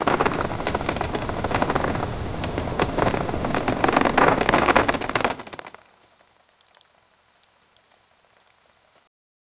detailed sound, materials

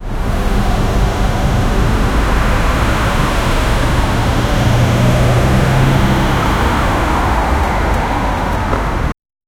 city traffic ambience

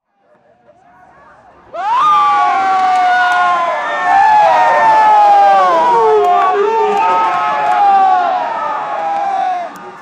the single sound of a, law enforcement

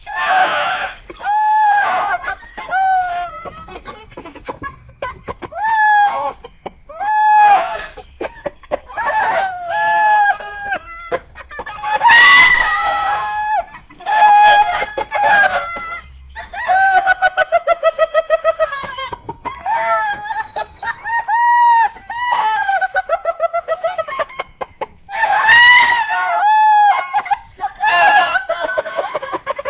laughter; two somalians poacher

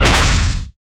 nuclear explosion

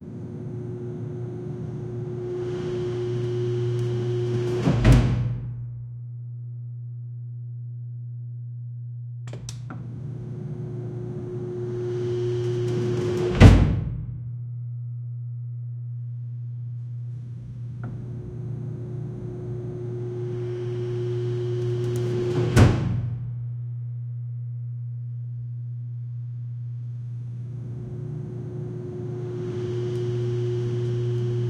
heavy industrial door, air release

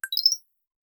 ultra short soft ui confirmation

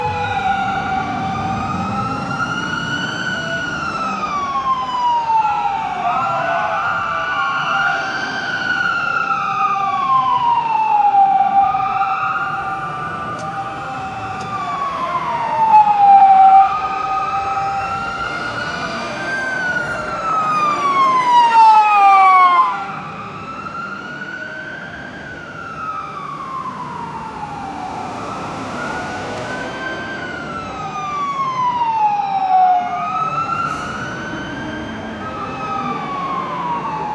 texture atmosphere ghetto

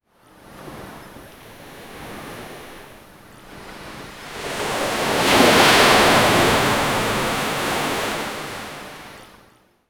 ocean waves crashing on

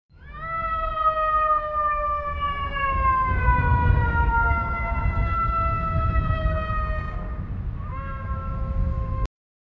sirens in city

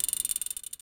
smooth metallic click of; equipment

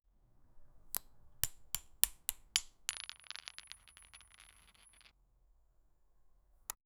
shooting a arrow; archery

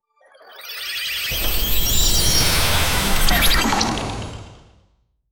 magical portal opening between dimensions

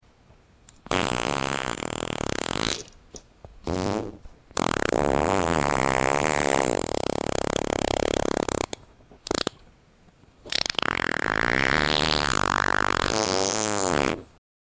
slowly fart sound; sound effect

